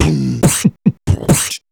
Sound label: Beatbox Loops, Human Beatbox